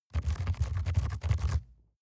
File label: rat run trample fast scrape